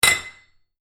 Metal Impact Clink, Basic metal hit with metal pipe; Metal Sound Effects; LYN097 package of Metal sounds; Lynne Music